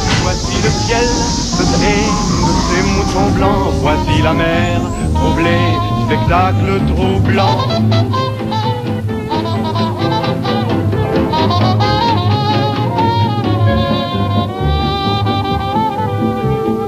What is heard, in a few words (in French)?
Chanson